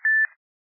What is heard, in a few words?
Beep once